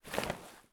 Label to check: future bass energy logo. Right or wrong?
Wrong